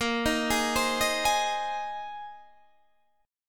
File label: Bb-7th Suspended 2nd Suspended 4th-Bb-down-Guitar-Standard; Bb7sus2sus4; arpeggiated; JGuitar